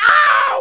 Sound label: ouch; Exile III v1.0